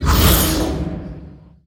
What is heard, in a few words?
summon penta wave